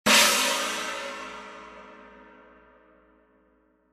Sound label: 18in China Low, cymbal